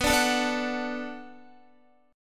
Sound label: B Minor 7th B Guitar, chord; Bm7; strummed; JGuitar